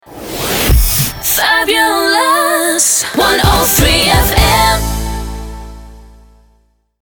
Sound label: Jingle Sting 128BPM